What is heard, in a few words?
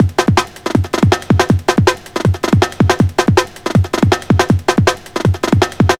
KINDLING, breakcore 160BPM